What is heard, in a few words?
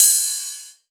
Ride Cymbal; Drums; House; Best Service; House Definition VOL-1; Cymbal Stuff